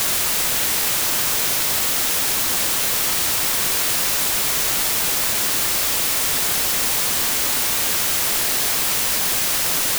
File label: White Noise